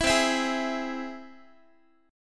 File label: C Augmented down Guitar; strummed; 19,20,19,18,x,x